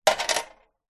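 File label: money coin single drop into empty metal box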